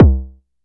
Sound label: kick, Novation Bass Station 2